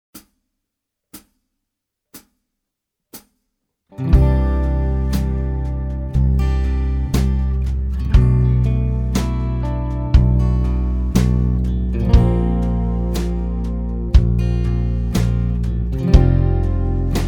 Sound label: Bass therapy